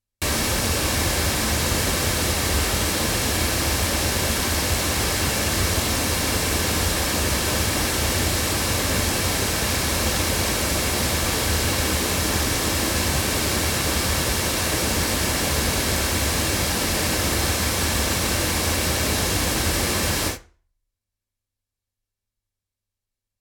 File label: Hansen HCM-101 loudspeakers, microphones